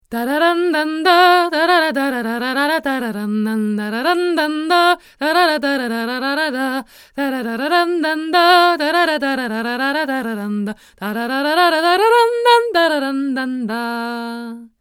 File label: Miktek CV3 far